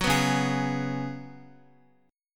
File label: Db 7th Suspended 4th Db down Guitar; strummed